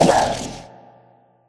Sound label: cry